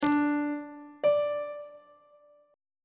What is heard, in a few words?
d note on treble clef